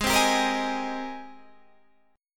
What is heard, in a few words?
Ab Major 9th Ab, Guitar Drop D